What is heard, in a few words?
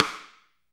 SD RI, SD Rim; Sound & Vision - Gigapack I